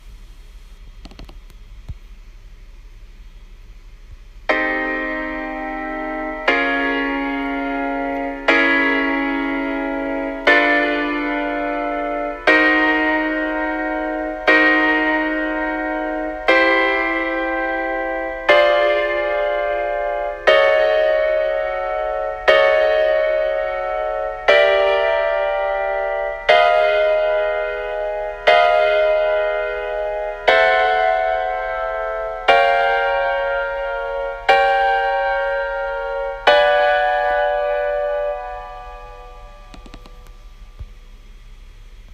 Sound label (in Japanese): マイナーシックスコード音源